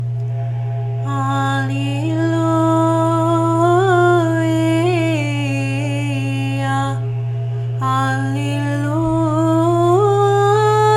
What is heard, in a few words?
alleluia mode 2